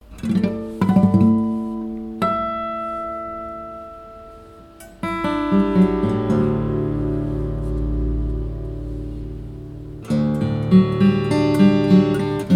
Lowden F-50 guitar; Brendan Maher

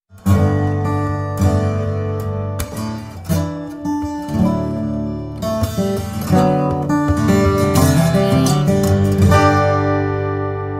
Lowden O25c sound impression; by Thomas Leeb

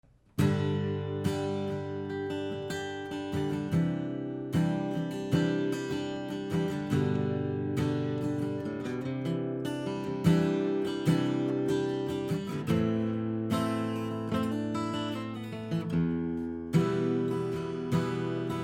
Guild USA D 40; Demo by Sean Gould

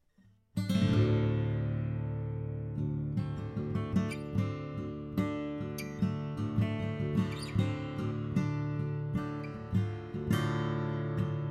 Martin 000 18 Modern Deluxe

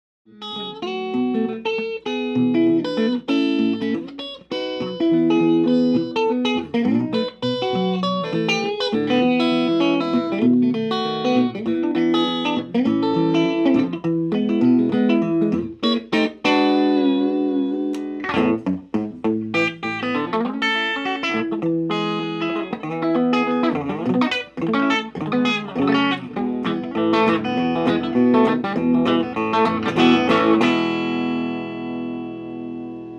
Gretsch G6120SSU Nashville

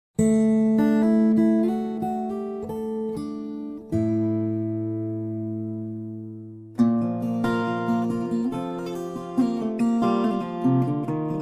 Veillette Terz 6 Mahogany Sitkas

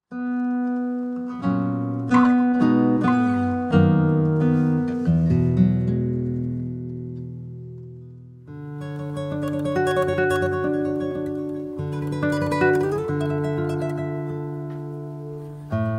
Lowden S 32J; The Jazz Collection

